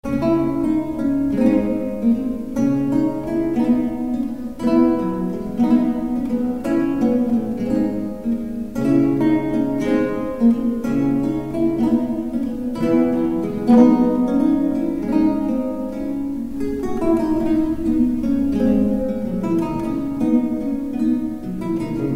folia pour guitare; SANZ (Gaspar)

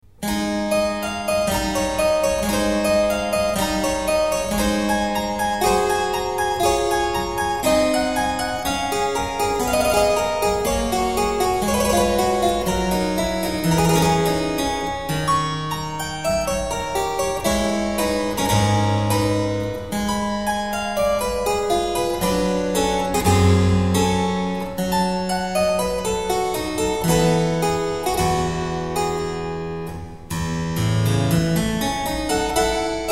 sonate pour piano; SCARLATTI; K213-4

